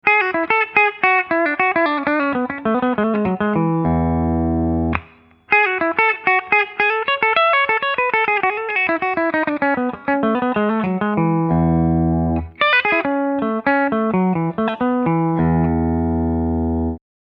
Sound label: epiphone sheraton ii bridge clean